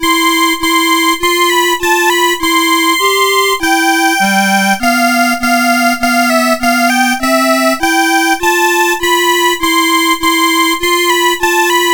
Duet, CSG8580R5; Graduation Match

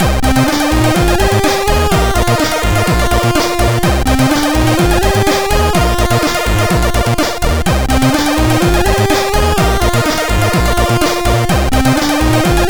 chip power mod, MOS6581R2

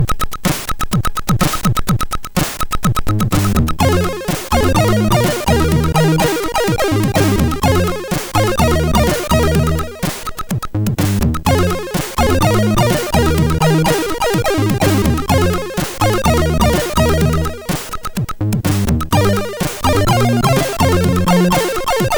Jingle, MOS6581R4

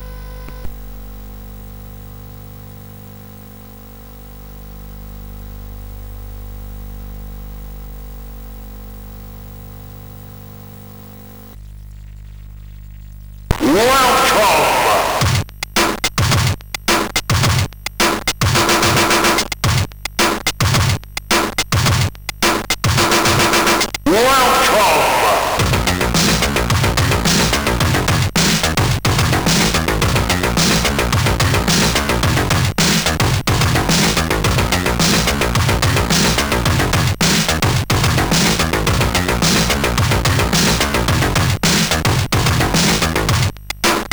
Drum Exercise, CSG8580R5

